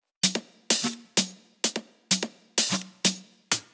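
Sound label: Drum Loop 128BPM Percussion solo; VEC5; Drumloops; Multi Loops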